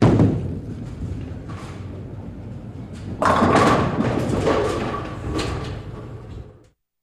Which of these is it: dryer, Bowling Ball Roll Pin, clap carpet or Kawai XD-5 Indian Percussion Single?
Bowling Ball Roll Pin